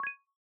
new rank; teardown ps5 windows xbox series xs gamerip 2022

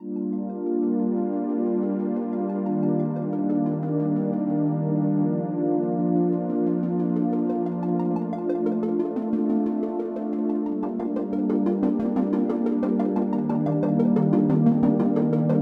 MUS START

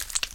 Pickup; Pinguinos Biri Game; Android

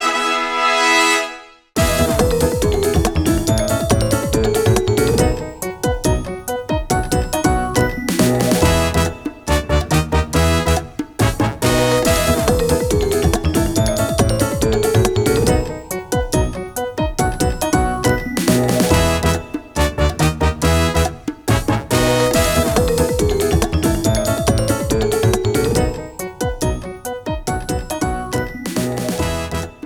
BGM Eruption; Super Mario Party Jamboree

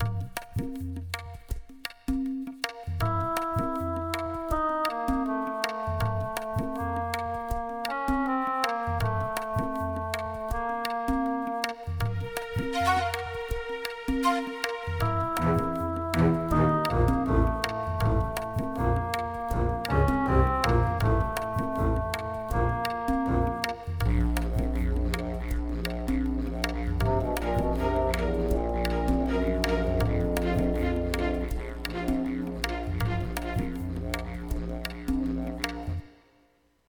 Target Sighted; Hunting Unlimited 2010; Zambia

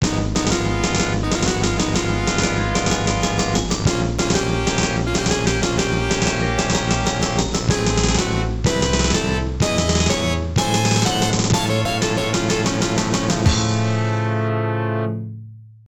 Mission Failed (Game Over); Star Luster; X68000

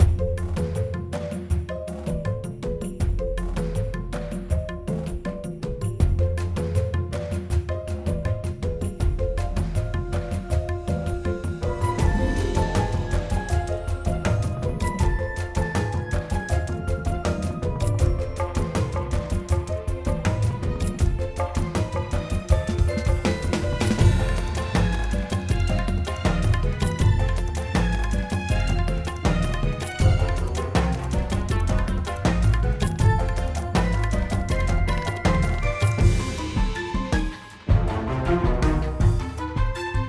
Battle Loop Regular

